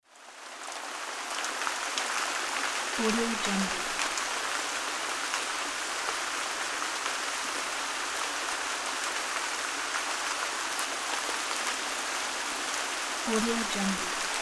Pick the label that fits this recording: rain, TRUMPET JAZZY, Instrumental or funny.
rain